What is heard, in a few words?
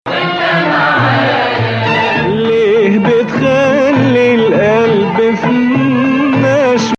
Nawa Bayati